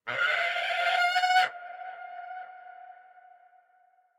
ambience bad birds b; smite; conquest

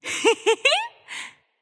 "yay"; smite; nuwa

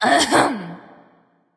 clear throat; smite; awilix

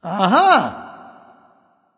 "ah", discover; smite; voicepack; ullr

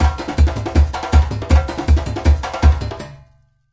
Lobby Victory Beat; smite; kumbhakarna

